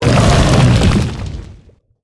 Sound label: Living Lava Death